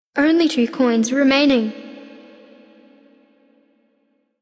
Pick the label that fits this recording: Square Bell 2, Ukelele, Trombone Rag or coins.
coins